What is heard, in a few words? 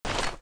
gun draw, weapons